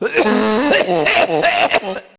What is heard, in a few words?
Cough strong